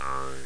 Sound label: spring; Image Bonanza; Expert Software